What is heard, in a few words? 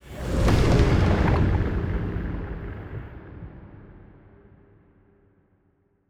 ship cruiser move; Stellaris; toxoids; ships